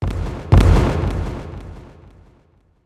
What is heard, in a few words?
ambient port; World Of Warships; port3d